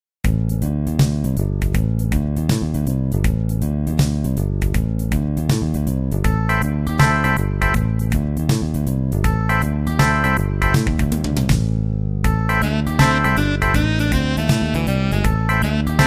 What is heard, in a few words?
town; maoudamashii; ninja